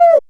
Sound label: Cuica, Roland JV-2080